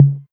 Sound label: tom mid, Novation Drumstation